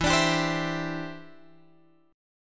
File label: E Minor 7th Sharp 5th, down, Guitar, Drop D